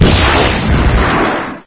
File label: stinger fire, weapons; Source Engine; video game